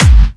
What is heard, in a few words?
Clubby Kicks, Bassdrums